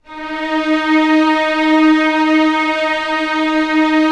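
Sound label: strings D#3 FF R; Sound On Sound - Peter Siedleczek's Orchestra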